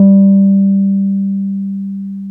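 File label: piano, Wurly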